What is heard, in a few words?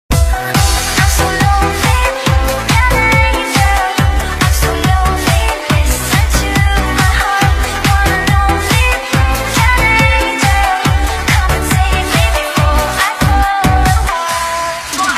ringtone